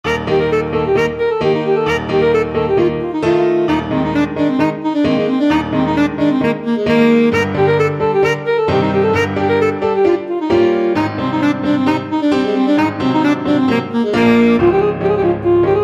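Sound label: romanian folkdance, alto saxophone; bartok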